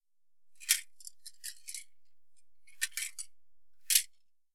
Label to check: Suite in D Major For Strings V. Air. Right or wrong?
Wrong